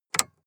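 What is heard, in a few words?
sports car interior lightswitch double short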